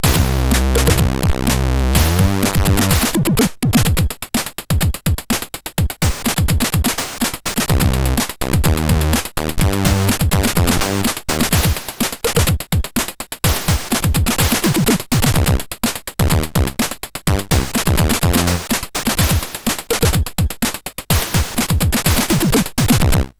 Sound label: Music, sid CSG8580R5